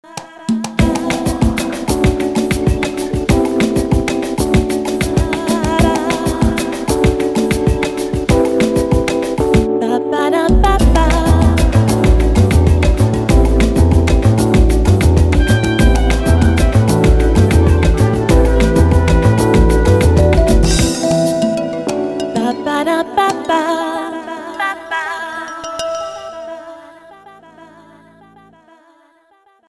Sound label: sunrise lounge